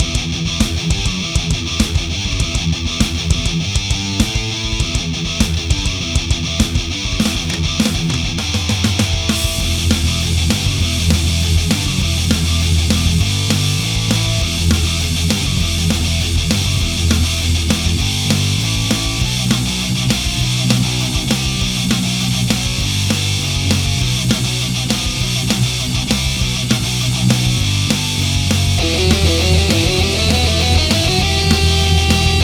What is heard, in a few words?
Powerful & Brutal Rock Theme (looped); Morkull Ascend to the Gods